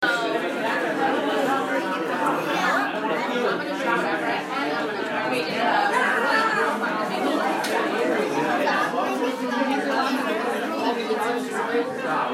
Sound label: Restaurant Ambience